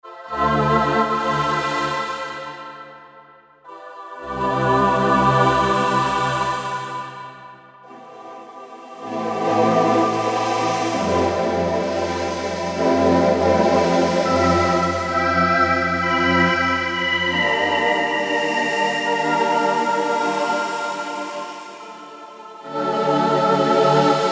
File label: Sensitouch, Korg MSC-11S Brass